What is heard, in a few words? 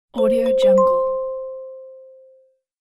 kalimba slow accept; پیام تأیید با ساز کالی; interface sounds